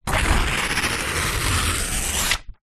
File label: paper sheets x2 rip tear; foley